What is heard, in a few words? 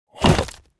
behemoth attack; propack; magic